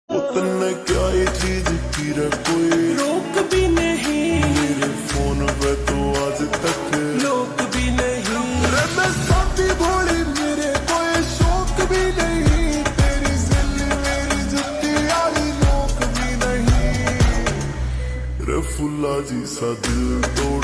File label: Ringtone Slowed Reverb